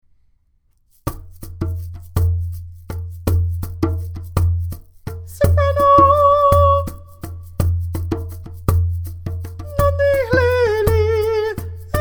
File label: soprano